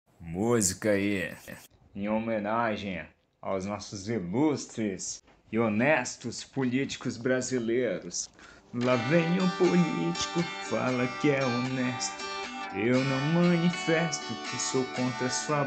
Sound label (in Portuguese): saxofonista